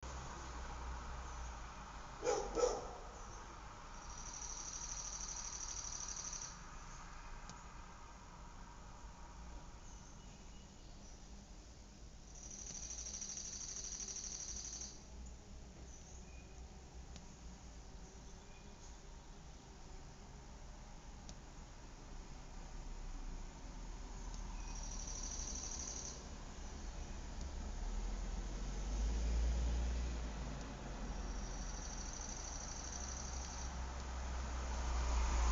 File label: outdoor suburb ambience